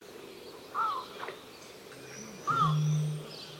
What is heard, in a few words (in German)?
rohrdommel